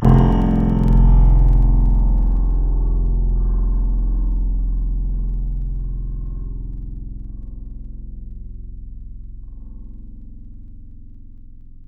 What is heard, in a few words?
Saz, Wavetables; Synthetic's Serum Collection; Standalone-Music SYMPHONY Wavetables